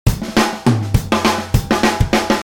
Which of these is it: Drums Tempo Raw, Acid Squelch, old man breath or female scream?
Drums Tempo Raw